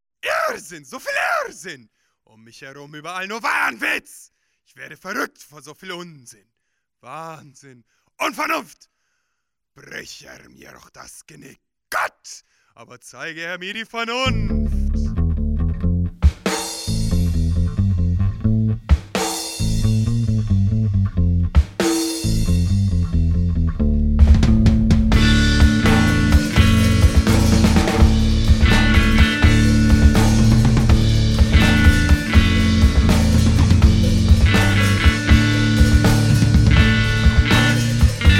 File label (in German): Praeludium in D-Moll; Expedition Tentakel Debakel